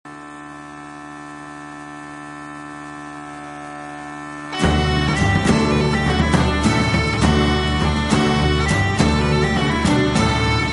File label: Bagpipes